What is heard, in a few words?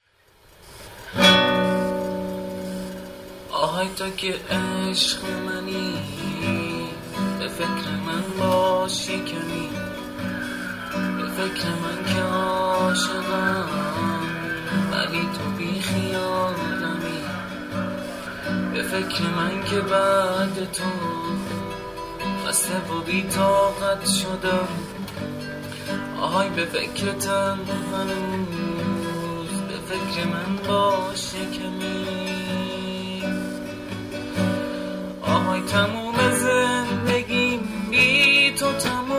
Guitar Version